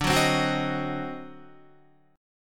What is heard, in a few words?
D 7th Flat 9th D down Guitar; D7b9 strummed; JGuitar